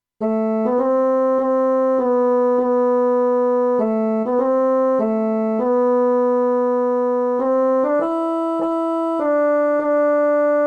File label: SATB